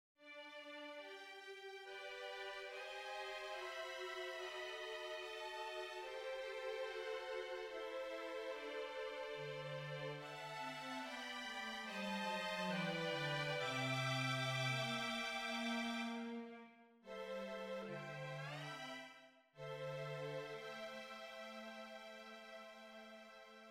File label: We Wish you a Merry Christmas string quartet